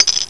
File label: Coins; Games World; Top 12 Shareware; Quarters v1.03